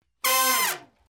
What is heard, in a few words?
unison; horns; C7 chord; Power In The Blood; It's a C7 chord